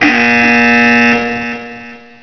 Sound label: buzzer